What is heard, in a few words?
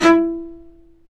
STR, Low Marcato; Roland L-CD702; Combos 1